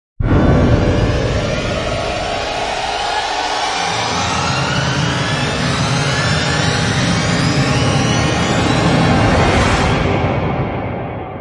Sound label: Orchestral Riser; Epic Tools vol. 1